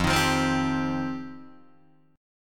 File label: F 7th Suspended 2nd F Guitar DADGAD; F7sus2; strummed